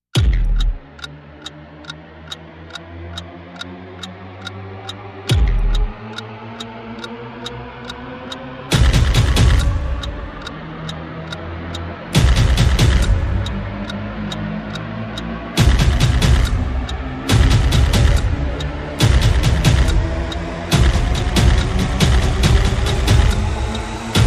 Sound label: Perc and FX